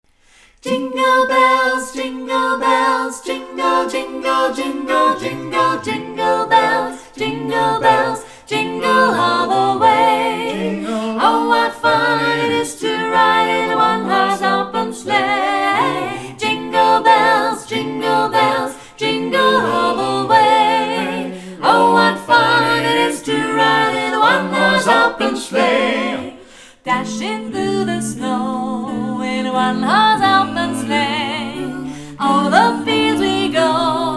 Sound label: Jingle Bells, Cappella